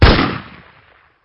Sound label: Pistol; CastleMiner Z; Xbox 360